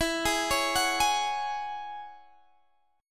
E 6th Add 9th E down Guitar Standard; E6add9 arpeggiated; JGuitar

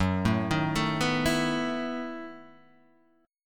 E Major 7th Suspended 2nd Suspended 4th Gb

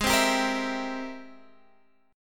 Ab Augmented Major 9th Ab Guitar DADGAD

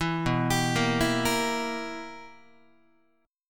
B Major 7th Suspended 4th Sharp 5th Guitar, chord; BM7sus4#5; arpeggiated; 7,7,10,8,8,9

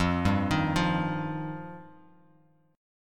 F 7th Suspended 2nd, Bass Standard; F7sus2; arpeggiated; JGuitar

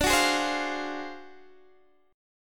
D 7th Sharp 9th Flat 5th D down Guitar Open D

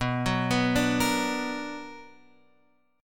B Minor Major 7th, Guitar, Open F; arpeggiated; JGuitar

